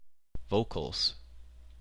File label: vocals